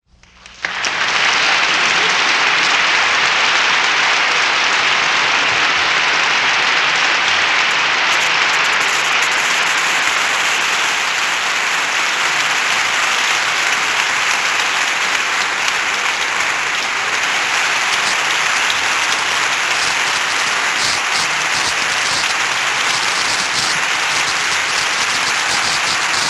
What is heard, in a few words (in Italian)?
Effetti Sonori - Applausi Grande Pubblico